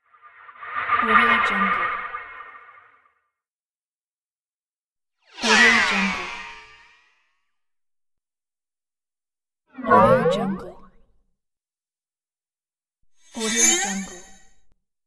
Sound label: flange transitioning